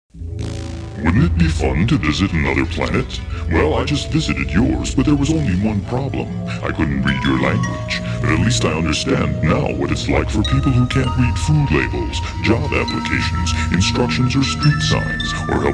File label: Jingle